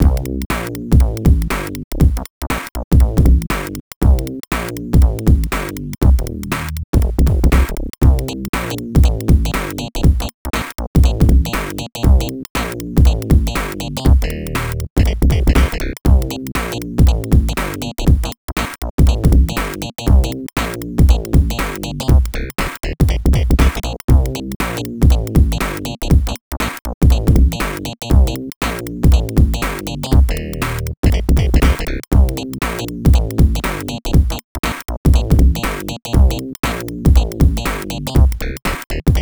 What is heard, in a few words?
Scoreboard Groove; NFL 95; Genesis